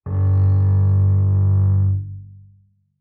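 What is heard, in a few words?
Bass arco fortissimo sul E G2, Double Bass; MIS Pitches - 2014; Strings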